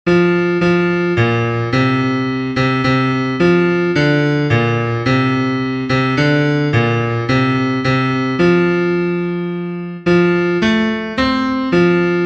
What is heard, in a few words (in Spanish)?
BAJO, himnos en piano; cantos del camino